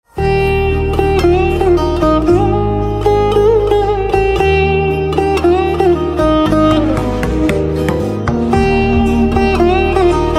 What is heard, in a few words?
Veena Instrumental Ringtone; sitar and veena